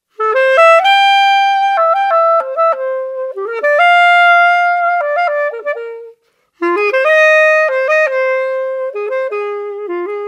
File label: Improvisation